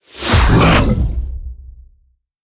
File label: Monster Spawn; Monster George